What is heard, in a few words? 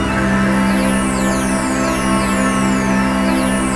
ATMOPAD; Best Service; XX Large; Pads